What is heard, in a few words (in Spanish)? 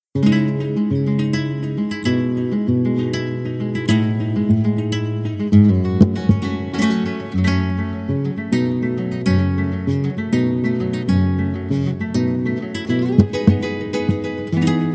Bulerias